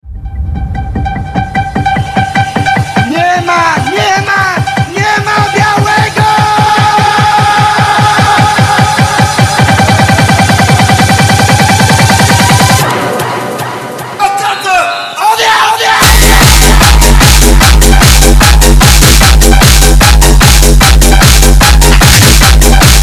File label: Phonk